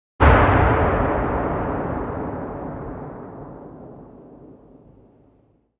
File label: expl mid tail, explosion; World of Tanks Blitz